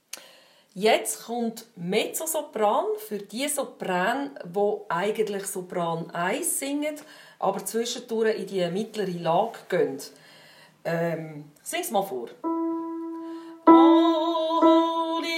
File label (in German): Sopran Mezzo